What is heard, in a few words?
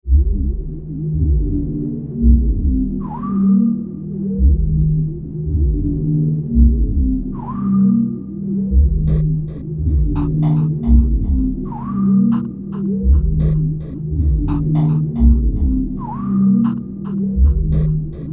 Bed Filtered Bass Rhythm; Blastwave; Beds